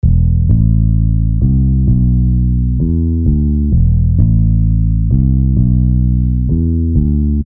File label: Bass Line